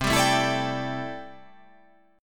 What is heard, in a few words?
C 6th Add 9th C down